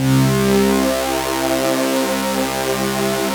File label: SYN, analog